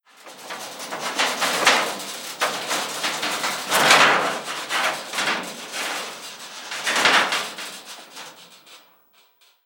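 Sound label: metal debris movement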